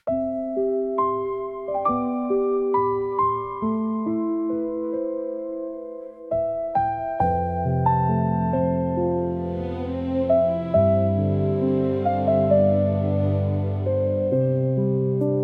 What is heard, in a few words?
Soft Footprints in the Air, fantasy music nature forest; ghibli style; wind healing nostalgia